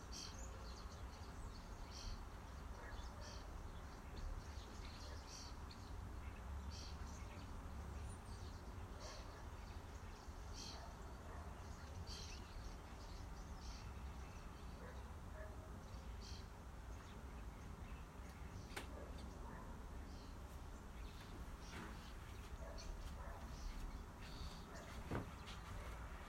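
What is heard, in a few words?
Starlings squabbling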